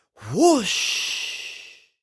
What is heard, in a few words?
Whoosh; Dry Tap